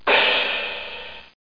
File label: CYMBAL; Simon and Schuster Interactive